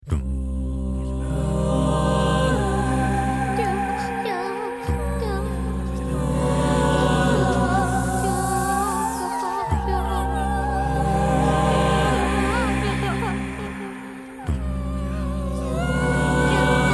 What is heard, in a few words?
Horror